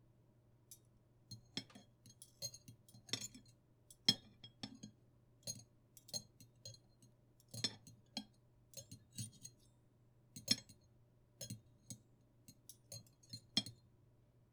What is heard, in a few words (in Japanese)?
食事中 カチャカチャ音小さ目食器音